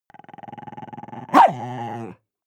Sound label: chihuahua growl bark